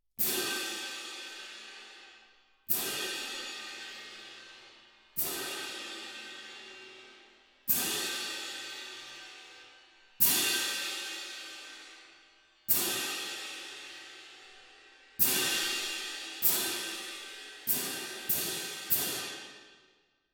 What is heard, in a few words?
Twin R Perc